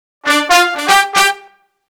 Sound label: Disco Fever, BRASS-LICKS; Best Service ProSamples vol.48 - Disco Fever; AKAI